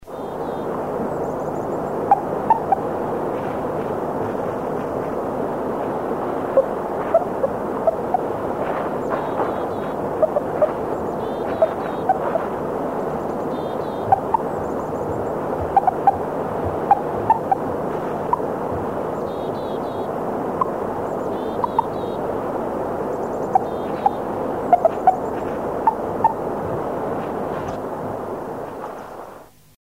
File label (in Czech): lasice